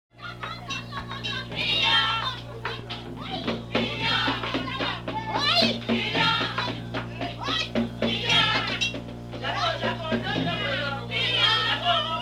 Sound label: haiti vodou; カリブ海の南国っぽさやアフロ的な土着的な風合い